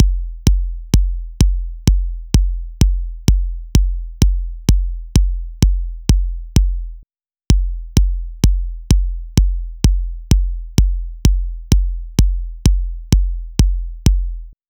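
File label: Drum Loop 128BPM bass drum C; VEC5 Drumloops; VEC5 Multi Loops 128BPM; Loop Elements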